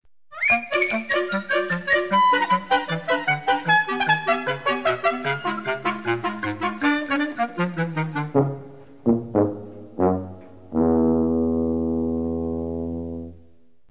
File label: Short Bridge